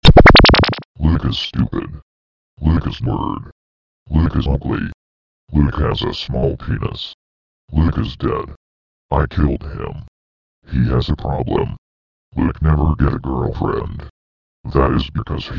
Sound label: Ska